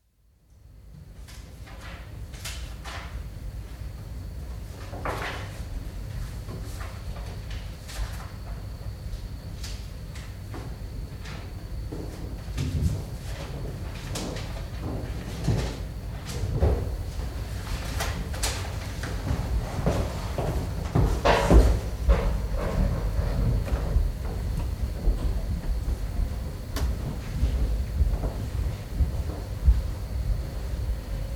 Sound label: Library, quiet atmosphere in galleried reference library, with footsteps and distant traffic; Building; BBC